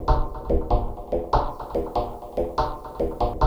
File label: perc bloop bleep, soundkits